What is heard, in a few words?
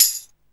Tamborine, Alesis Hr16, Drums